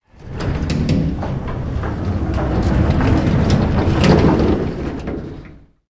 air stall rattle, engines; War Thunder

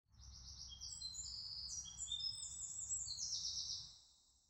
europe birds; ambient; War Thunder

